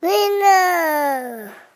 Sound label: fire engine